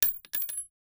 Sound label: cart